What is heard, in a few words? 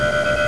win paid; Video Poker 2000